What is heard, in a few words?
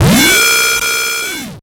Title Transition Ascend; Adobe; Production Element